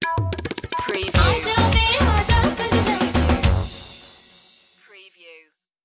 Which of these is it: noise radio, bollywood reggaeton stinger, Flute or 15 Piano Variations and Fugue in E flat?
bollywood reggaeton stinger